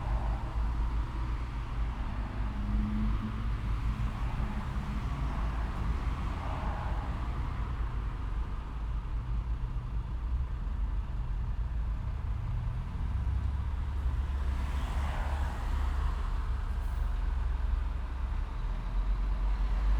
Outside Traffic Crossroads binaural

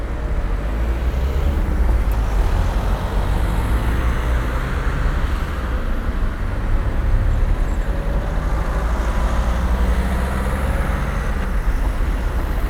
Crossroad noise, Background Noise; TS 103 224 Background Noise Database; SymmetricArray

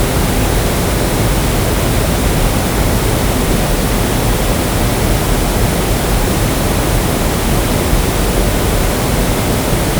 Pinknoise no HATS handset; TS 103 224 Background Noise Database

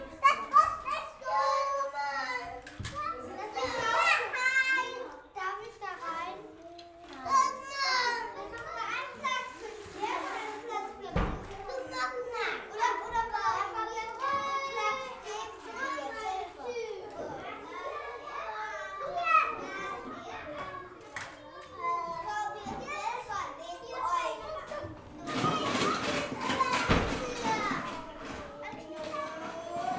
Kindergarten Noise binaural

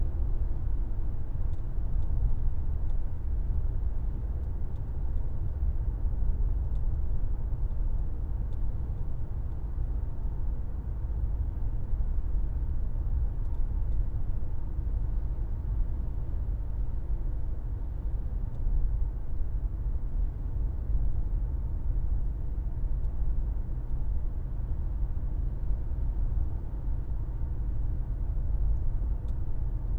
Midsize Car 100Kmh, Background noise

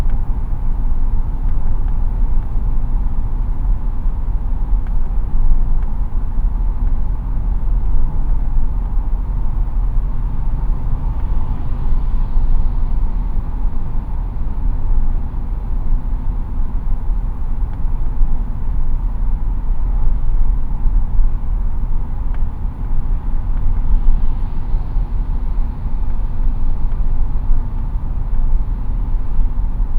Full Size Car 100 binaural, Background Noise; TS 103 224 Background Noise Database

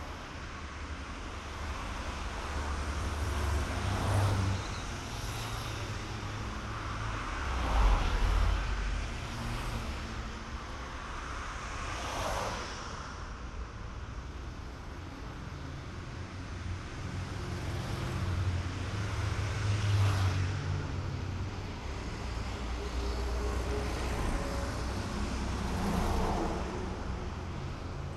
Outside Traffic Road binaural

